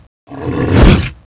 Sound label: attack; fallen titan